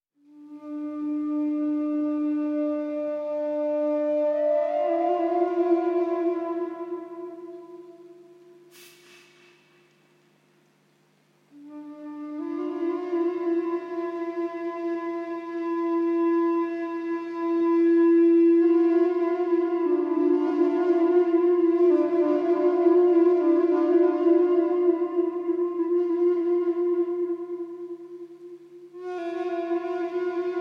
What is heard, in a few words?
Flute Organ Piece